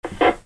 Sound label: PHONES